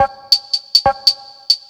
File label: NRG Percussion, Trance, Drums; Sonic Foundry (Sony Creative Software); Trance NRG VOL-1